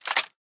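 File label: inventory items ammo; STALKER; IWP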